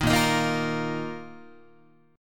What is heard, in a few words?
Bb Suspended 4th Bb down Guitar; Bbsus4 strummed; 6,6,6,8,9,9